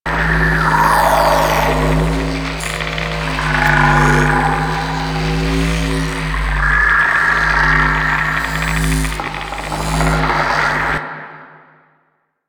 Portal Loop; Entrati Labs

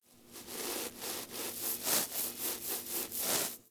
Foley Interact Stand Scratch Side; Enemies; Kubrow; Warframe

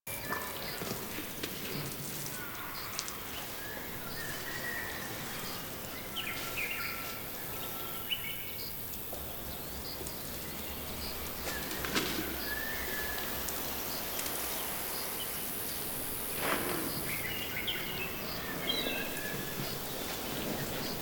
Camp Day Ambient Loop; Warframe; Quests; TheNewWar; Ambience; Drifter Camp

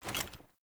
Foley Action Armour Jump Land; Characters; Player; Deora Armour

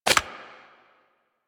Cancel Draw, Weapons; Warframe; Infested; Inf Cernos

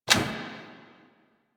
UI Transition Character; Warframe; Caliber Chicks minigame

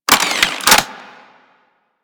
Anti Aircraft Gun Un Equip; Weapons; Warframe; Archwing

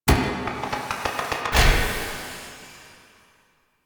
Connector Shrine Activate Full; Warframe; Ambience; Entrati

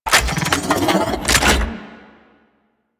Arch Gun Reload; Warframe; Archwing; Hildryn

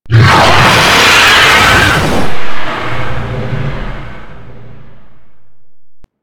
Golem Attack Roar; Warframe

